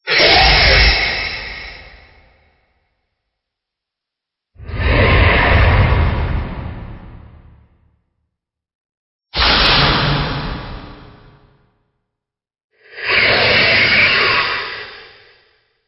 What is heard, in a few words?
Stinger; STINGERS